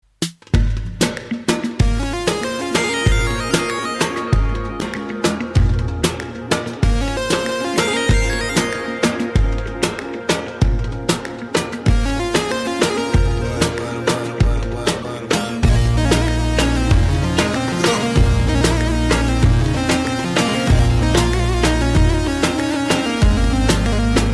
soul

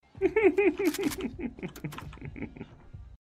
The Giggle

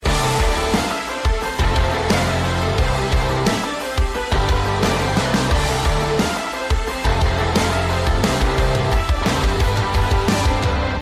Torch Rock

